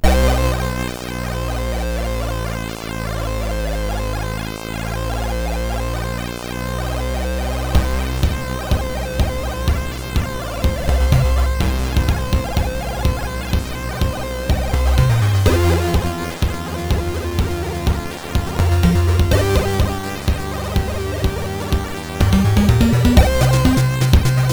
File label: synth, OctaMED MED